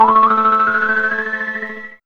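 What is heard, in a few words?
synth wobble; X-Static Goldmine 1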